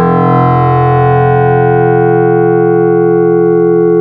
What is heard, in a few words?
Harmonics Buzz Bell; Ableton Live 12 Lite; Vector; Sprites